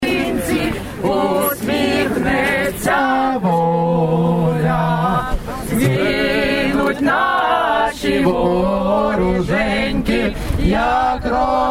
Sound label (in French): Chant ukrainien; Chartres